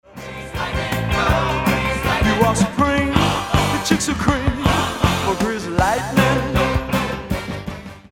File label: dirty orig